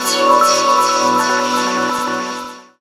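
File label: Pad Synth; Equipped Music; Loungin House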